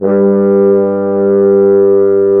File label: Brass Mute; CMI